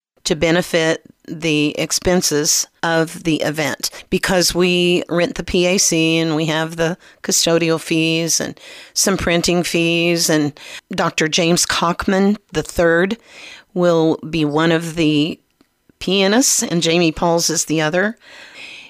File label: Hymn Sing